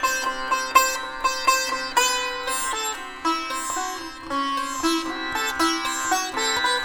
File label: SITAR, Indian Traditions